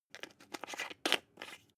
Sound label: glass pepper cap screw down; soundpool